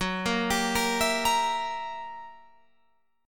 F sharp 7th Flat 9th F sharp down Guitar; arpeggiated; JGuitar

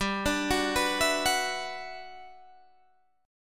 G Major 13th, Guitar DADGAD

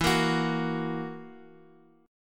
E Suspended 2nd Flat 5th E down Guitar; strummed; JGuitar

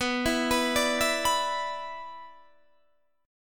B-Minor Double Flat 5th, Guitar DADGAD

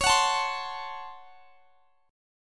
Db Major 7th Suspended 4th Sharp 5th Db down; strummed

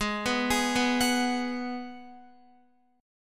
G sharp Minor 7th G sharp, Guitar; G#m7 arpeggiated; JGuitar

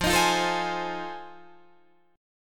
F 7th Sharp 9th, Guitar, Open D

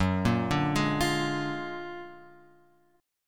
F sharp Augmented Major 9th F sharp; F#+M9 Chord {2 1 0 1 X 1} arpeggiated

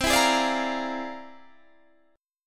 F Minor Major 13th, C

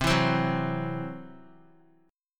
C-Major Flat 5th, Guitar; strummed

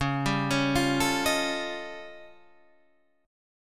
D sharp 9th Flat 5th C sharp, guitar

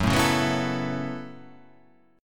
F sharp Minor 11th, guitar

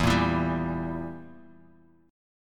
E Diminished E down, guitar; Edim strummed; JGuitar

